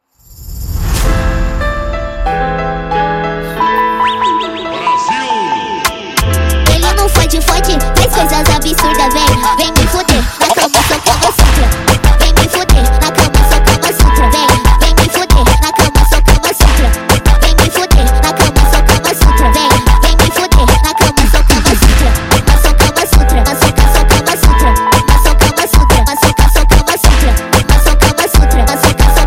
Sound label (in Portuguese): Brega